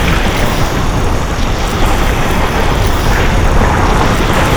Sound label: gore pipe; Quake 4 Alpha; id software